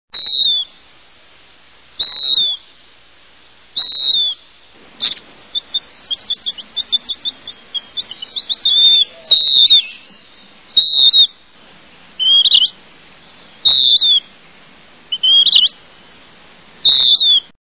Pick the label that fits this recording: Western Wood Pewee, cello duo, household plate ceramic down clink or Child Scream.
Western Wood Pewee